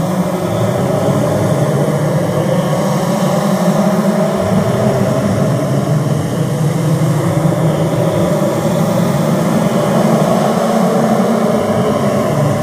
controller presence r

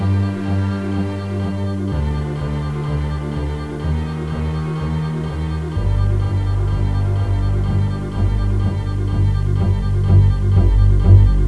intro sequence

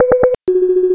success

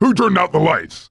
die; sven; ssam

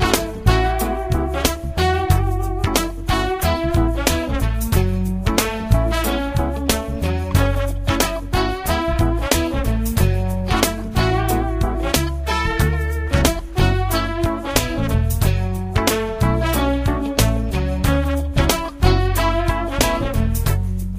jazzy, music; sven

